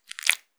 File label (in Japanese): くちゅ音単発, ASMR